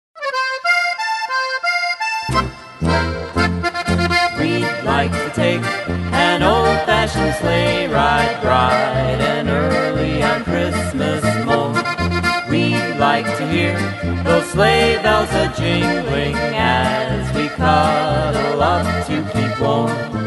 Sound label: Sleigh Ride Polka